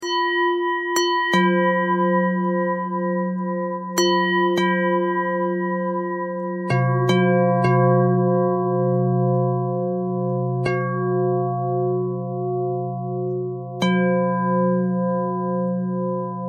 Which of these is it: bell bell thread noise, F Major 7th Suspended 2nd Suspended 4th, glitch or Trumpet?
bell bell thread noise